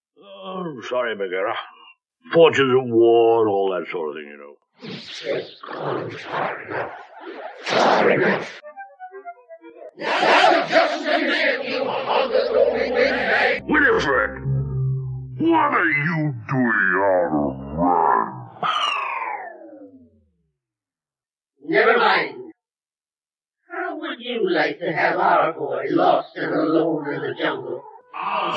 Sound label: jungle raw; cminifie